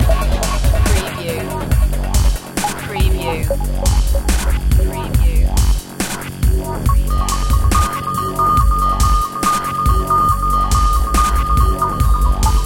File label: inevitable drum loop